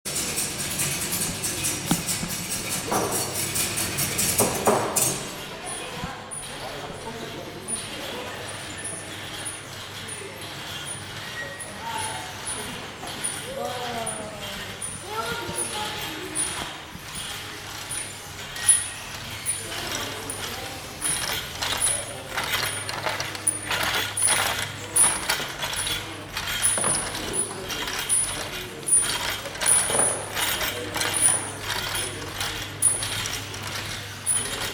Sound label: Basel Tinguely Museum; MS ZOOM